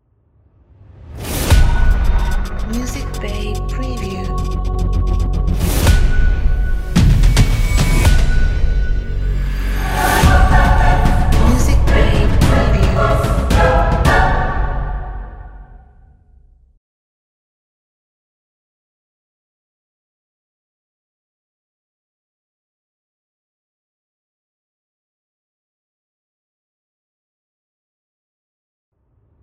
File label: Epic Hybrid Logo